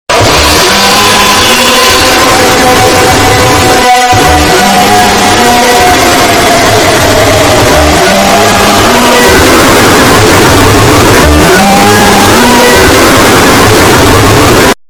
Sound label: jumpscare sound